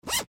Gym Bag Zipper, Gym Bag Zipper Unzip; Pocket; Equipment; Sports

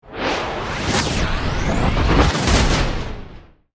Stinger Warble, Stinger, Warble; Production Element, Imaging Element, Accent, Transition; Stinger Sound Effects

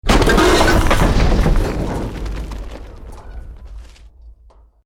BUILDING COLLAPSE SCAFFOLDING SMALL

